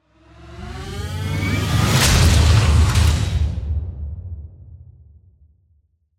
Mechanical Join, Mechanical, Joint, Connect, Digital, Charge, Up, Slam, Transforming, Robot; Production Element, Imaging Element, Accent, Transition; Spacecraft; BlastWave